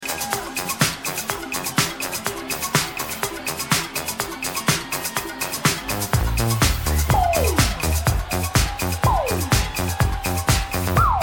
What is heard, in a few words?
BoomBox Jam